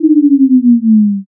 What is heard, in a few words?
debuff